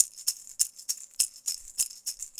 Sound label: maracas 100, latin, 100bpm; Sound Effect Library